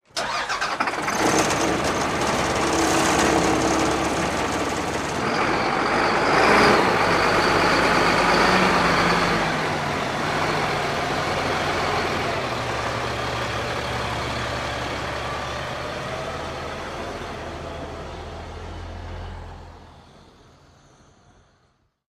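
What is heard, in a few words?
school bus away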